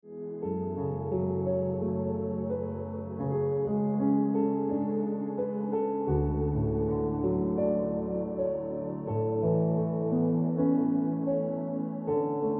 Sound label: Piano Music